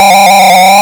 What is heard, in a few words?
Skid; Joust